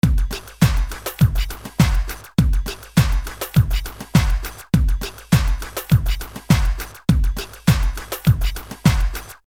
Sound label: smooth nyc house drum beat 102 bpm